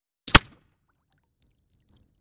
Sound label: Bullet Impacts Water